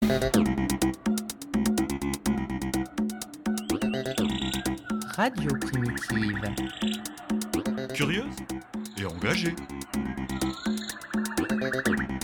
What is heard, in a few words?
noise annoys